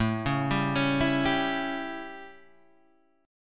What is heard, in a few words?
A 7th Guitar Open G, chord